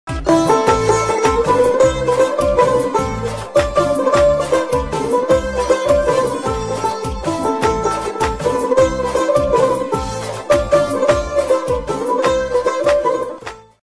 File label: Bamtar; تار باس